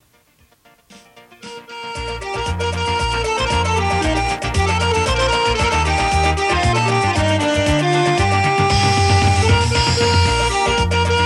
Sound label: Music Pause